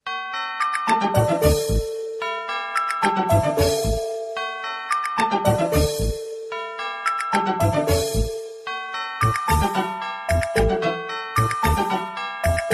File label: Happy children's song